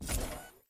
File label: exo step; STALKER; IWP